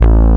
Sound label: DD5 BASS